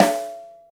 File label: subtle reverb snare sound E key